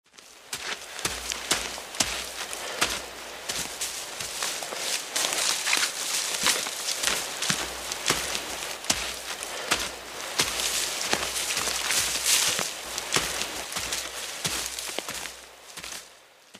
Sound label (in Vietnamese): am thanh cua mot con nai di qua la